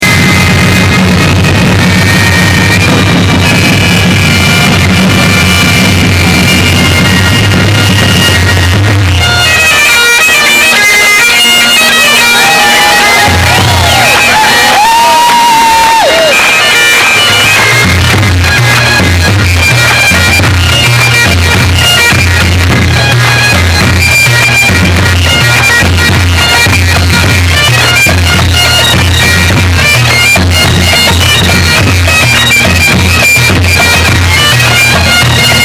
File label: SteamTrain; BAD SOUND